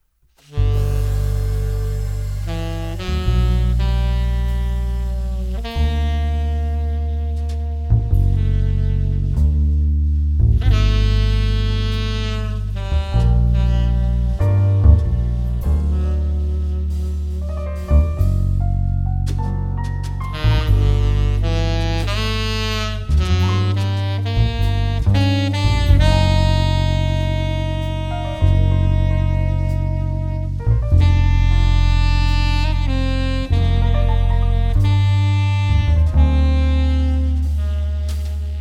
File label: jazz